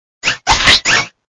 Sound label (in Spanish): Grito de Applin